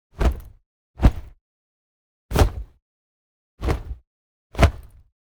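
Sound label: Punch Element Whoosh; The Sound Keeper; Combo Pack Sound Effects Pack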